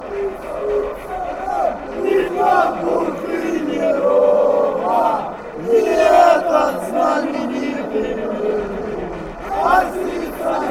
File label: chant, fanchants; metalist